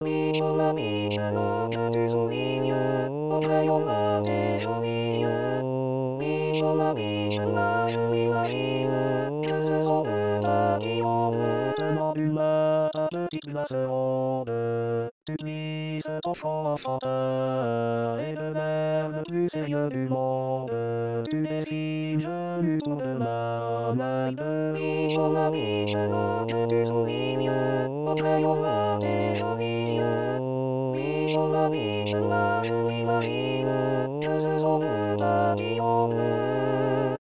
les 3 voix

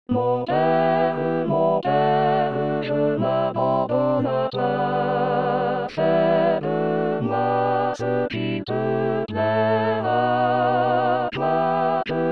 les 4 voix

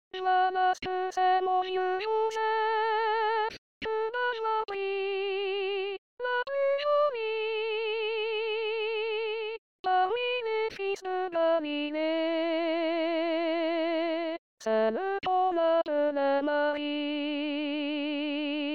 femmes

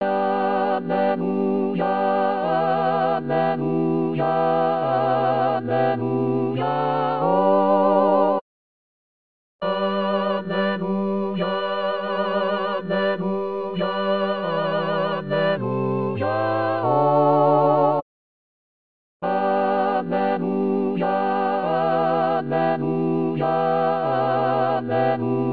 alleluia malgache les 4 pupitres

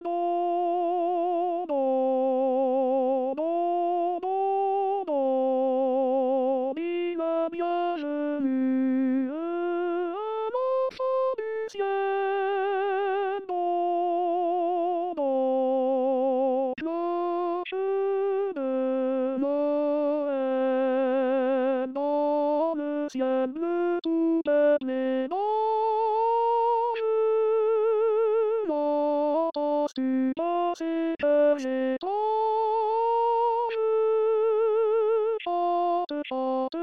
hommes; la cloche de noel

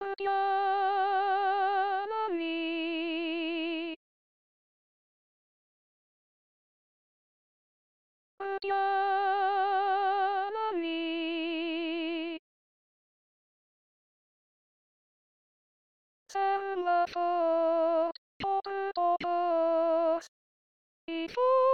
femmes aigu

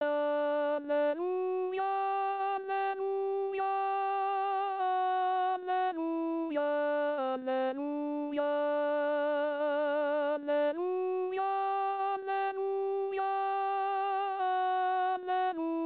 alleluia alto

